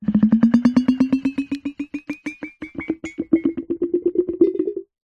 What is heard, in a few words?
straw blow air into slime creates bubble expand